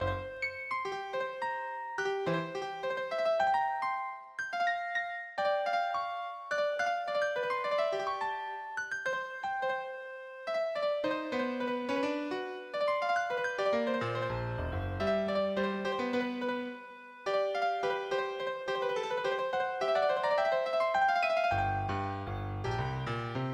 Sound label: piano